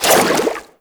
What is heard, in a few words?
Aqueous Discs Hit; Warframe; Yareli